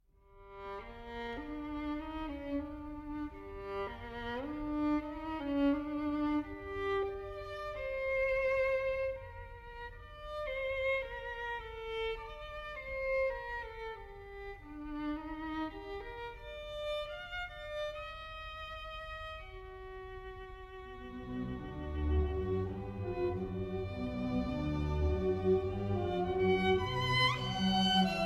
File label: Concerto No. 1 in G Minor for Violin and Orchestra